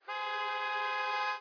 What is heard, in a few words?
car honk